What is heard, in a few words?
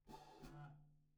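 SNR Bot, snare bottom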